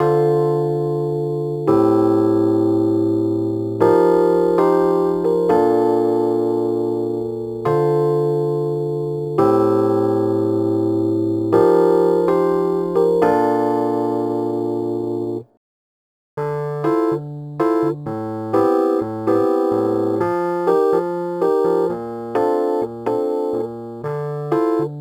Rhodes Attacks; Ueberschall

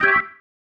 Stab, Organ, One Shots

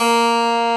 KEYBOARDS, Roland LA Composer; Roland - LA Composer vol. 1

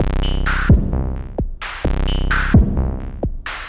MODU C, Modular Synth Loops

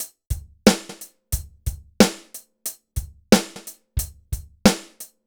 BPM 90 DRY

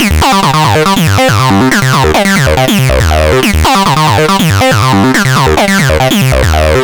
Synth

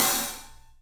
Studio Hats, 3DPercussives

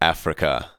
vox; Ueberschall; Techno Trance Essentials

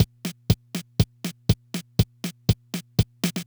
Loop, dd-5

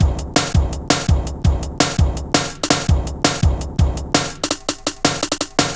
Bouncy, Jungle, Drum Loops 166.5

